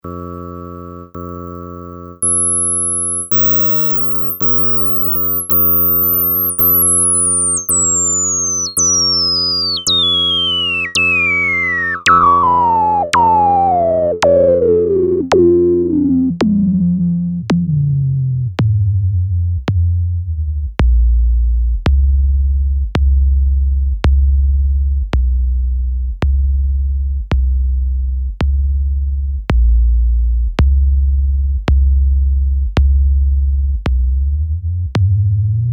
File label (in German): Wavetable piano mit hohen Resowerten